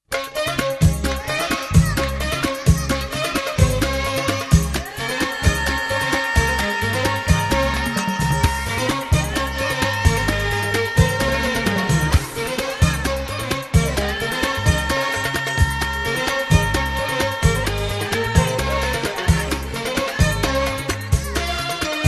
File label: Instrumental, maroc; Ayta Daoudia